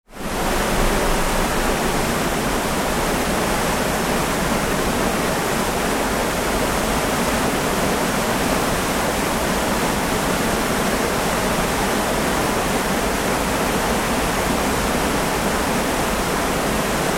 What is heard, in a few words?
Ship Large Ferry Deck Atmosphere under Sail; Tom Hutchings